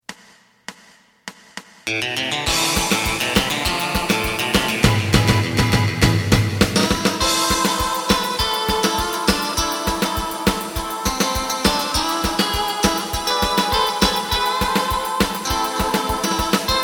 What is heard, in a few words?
bass